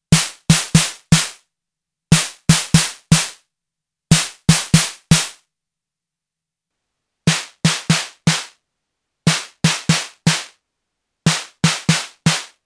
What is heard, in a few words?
Snare Single Out vs Mix Out; Blck Noir